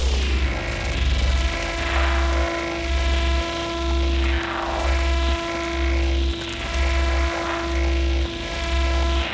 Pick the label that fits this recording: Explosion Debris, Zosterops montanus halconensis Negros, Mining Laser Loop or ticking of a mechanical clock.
Mining Laser Loop